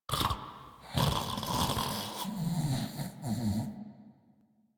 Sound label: Snorts; Warframe; Deimos; Father; Vocalizations; en